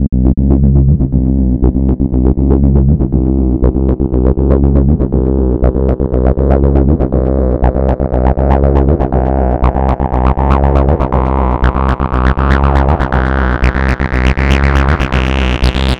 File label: Bass Line